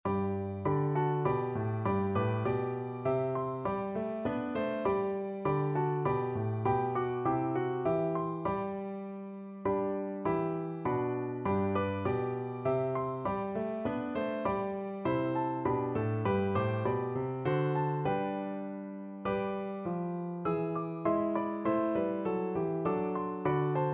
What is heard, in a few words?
piano, piano duet